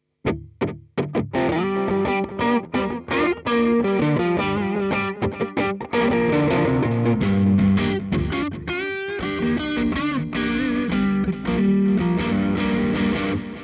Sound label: Lead Delay; rock tone; Carbon Delay; virtual amplifiers